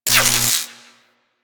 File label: Mag Spark; Archwing Intro Cinematic